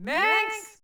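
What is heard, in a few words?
hobbit yell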